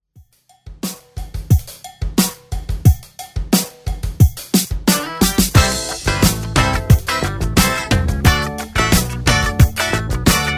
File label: 70s Pop Redrum